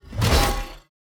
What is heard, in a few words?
fallen angel strike